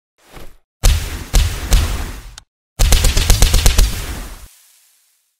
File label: lasgun; warhammer 40k